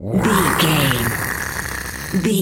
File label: lizard taunt hiss